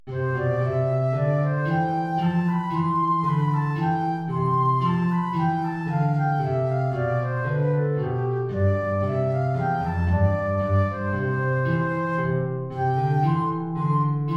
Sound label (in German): Air Querflöte Gitarre; BWV 212-24